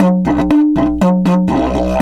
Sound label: 120, Didgeridoo